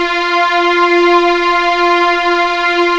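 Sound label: F4 legato F L, string; East West; The Ultimate String Collection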